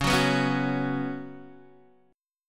C sharp 7th Suspended 4th, C sharp, down, Guitar, Drop D